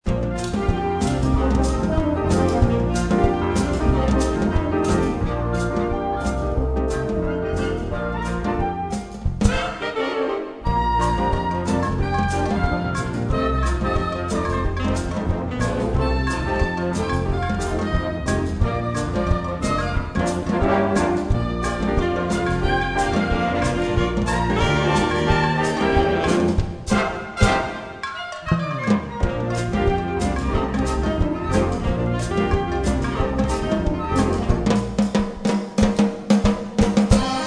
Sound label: world music concerto